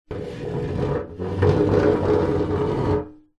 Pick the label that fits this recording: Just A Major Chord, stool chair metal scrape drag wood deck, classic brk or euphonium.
stool chair metal scrape drag wood deck